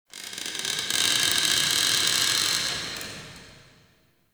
Ancestor Tree Wood Creak; Soul Plane

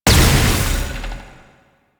Wave Ability Proj By Explosion; Enemies; Abilities

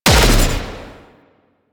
Air Pistol Projectile Explosion; Warframe; Corpus

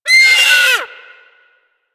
Sea Bird Vocal Death; Warframe; Hunting Critters; Ostron Sea Bird

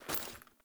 Foley Run, Clothing; Characters; Player

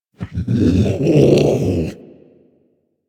Predator Vocal Captured Idle

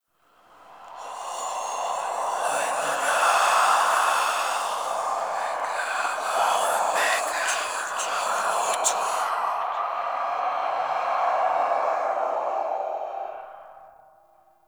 Whisper Blood Enemy; Ambience

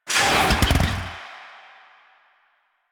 Power Slide Stop, Motorcycle; Motorcycle Ninety Nine; Bullet Jump

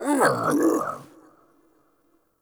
Cannonball Thrust Vocal; Powers; Bromius

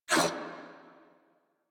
Pain, Female; New War; Narmer Grineer

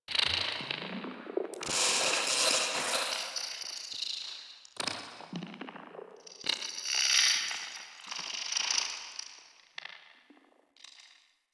Tech One Off, Ambience; Vitruvian Memory

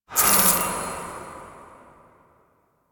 Boon Reroll; Warframe; UI; Duviri

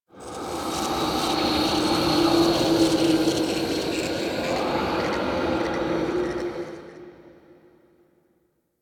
Howls, Asteroid; Ambience; Warframe; Grineer Asteroid

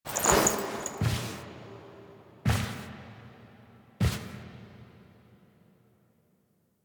Foley; Stalker; Warframe; Quests; The New War; Sealab; Hunhows Lair; Hunhows Lair Part One

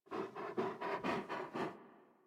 Tame Sit Idle Pant; Enemies; Warframe

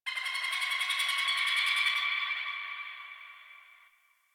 Forest Bird High; Warframe; Grineer Forest

